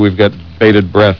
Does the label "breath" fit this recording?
Yes